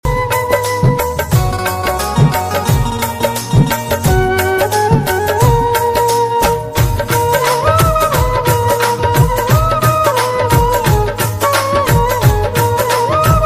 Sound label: Khatu Shyam Bhajan